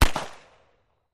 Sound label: Pistol Shot